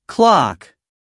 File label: Clock